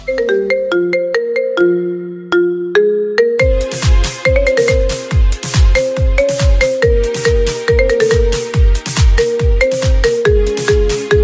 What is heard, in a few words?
Marimba